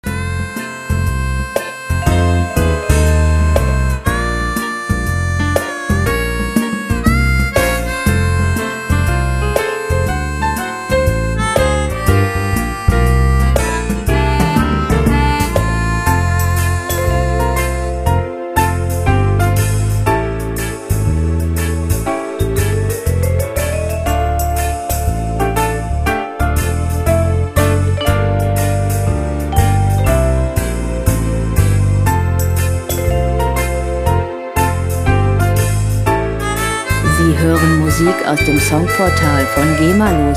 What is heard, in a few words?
slowfox